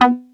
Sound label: Arp B B3, ARP Odyssey; synth; musicradar-synth-samples